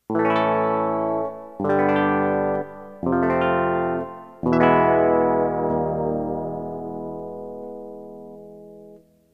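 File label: gtr jazz, guitar jazz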